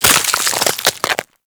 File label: Ice Freeze Hit